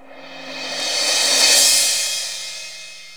CYM ROLL

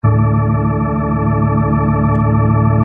VIBHAM1, KEYS

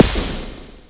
snare

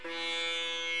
sitar

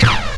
beat, drums

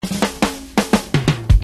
BRK FILL, beats

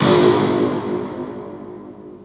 Spring; cold

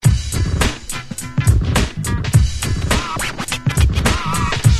BRK BEAT, beats

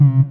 sine ting

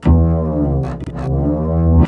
Gliss dwn, Bass

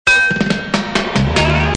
BEATS

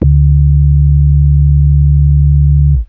Sub bass

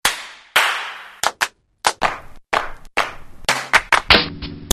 misc clap

